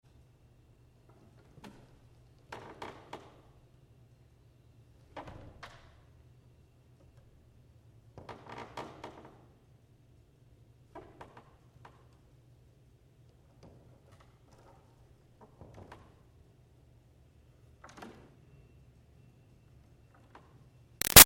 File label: Creaks Wood Church Pews Very Subtle; Ambient; Creaking; Objects; Big Room Sound